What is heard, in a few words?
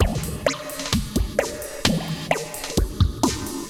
Moog Phaser HR-16B (130BPM), cyborg beats; musicradar